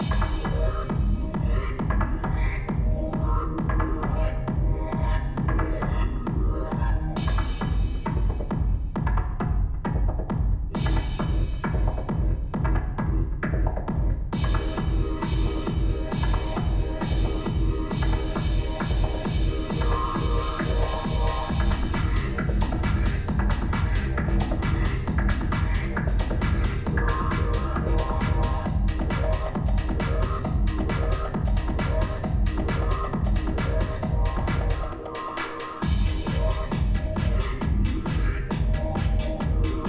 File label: Club Music; Spider-Man 2